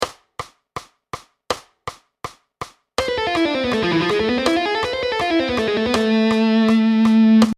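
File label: Hirajoshi And Harmonic Minor Example, guitar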